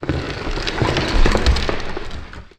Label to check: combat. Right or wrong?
Wrong